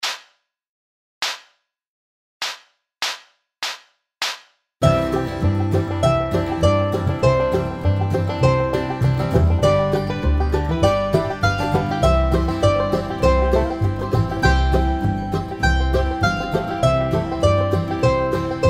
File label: Mandolin